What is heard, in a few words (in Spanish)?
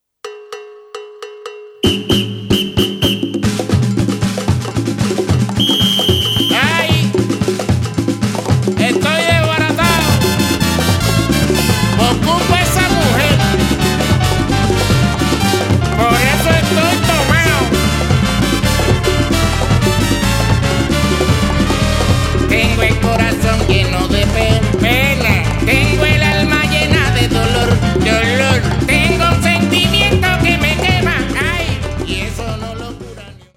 Rumba